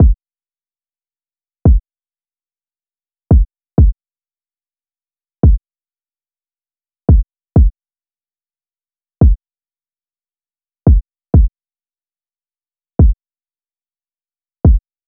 Drum Loop 127 BPM Kick; Loop Stems; Cymatics; Vibes II Drum Loop Collection; Matriarch Drum Loop

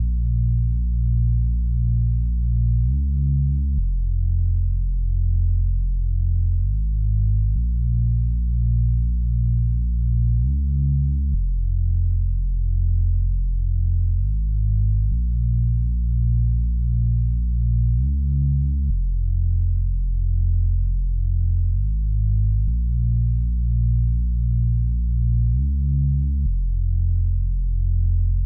127 BPM F Min Bass; Trap Melody Loops; Loop Stems; Cymatics; Nightmares; Major

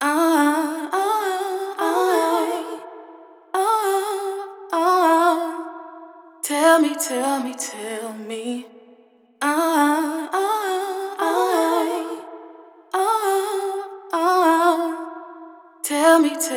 Vocal Phrase "Tell Me" - 102 BPM E Min Wet; Vocals; Cymatics; ZODIAC Beta Pack

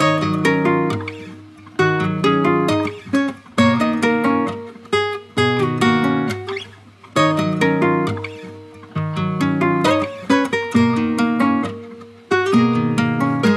Journey Guitar Loops, 134 BPM D# Min; Down The Road; Cymatics

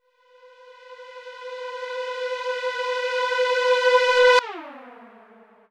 Light Tonal Reverse, B; House; FX

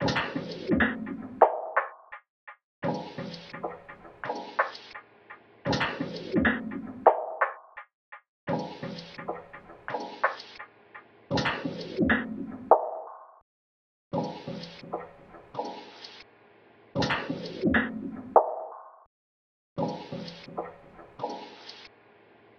Technicolor Perc Loop - 170 BPM; Cymatics; Fiji Hihat Loops & Percussion

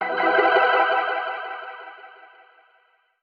ACCENT Mirage, 169 BPM E Min; Ear Candy

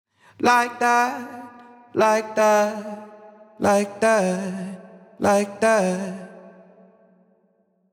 Sung Phrase Wet - 150 BPM F# Min, Vocal; Like That; Cymatics; Euphoria Vocal Sample Pack

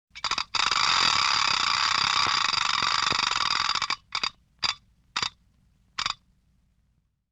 Toy Ratchet Noisemaker; Vintage Cartoon; Foley

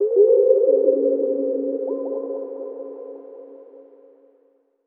SYNTH (C); Communication; Cymatics; Mystery Pack Vol 4

